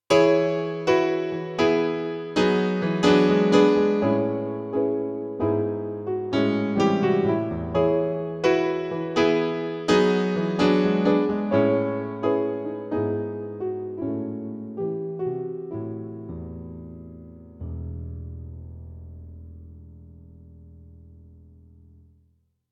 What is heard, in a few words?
Piano; XV-88